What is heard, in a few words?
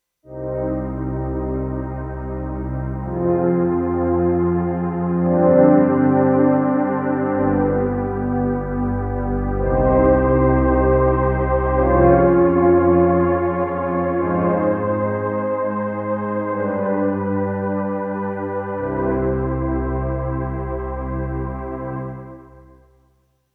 pad